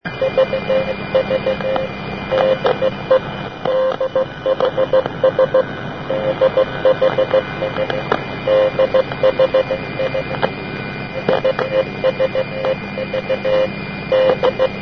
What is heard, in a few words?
cw